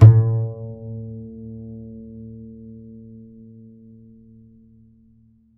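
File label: double bass A2, Jazzistic; DBASS; Univers Sons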